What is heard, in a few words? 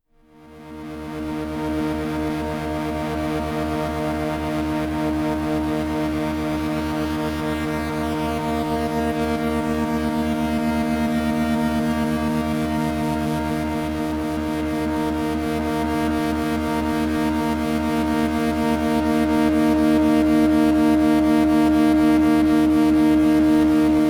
Desert Rock